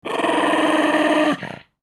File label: mad dog